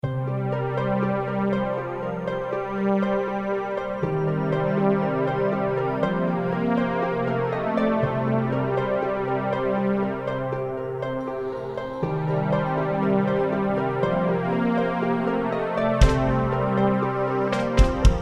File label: creeping horror